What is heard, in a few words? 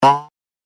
Pantomime Pull Hair; Cartoon Sound Design